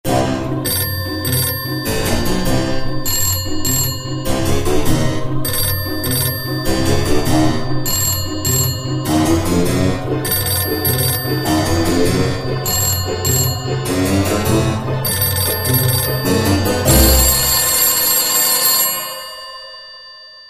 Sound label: rock ringing call tone